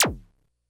Bleep A, Drum Machines, Korg DS-10

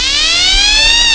sirene; Amiga Soundtracker Sample Packs

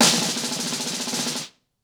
Snare FX 90BPM, ACOUSTIC DRUMS

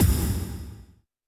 percussion, Roland TD-20

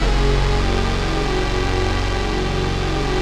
basses; Wizoo - Powered Wave

